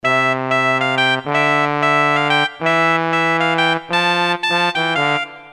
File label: MU50 Brass